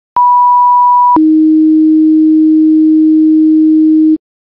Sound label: duty, tones